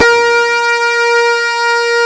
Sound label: kawai lead gui; Amiga Soundtracker Sample Packs